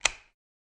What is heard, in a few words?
Light Switch Lamp; switches